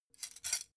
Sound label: dough hook grab; industrie-wirtschaft; gastronomie